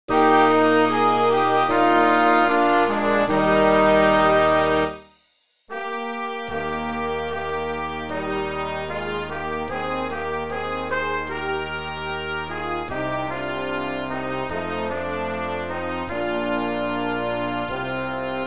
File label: My Old Kentucky Home playback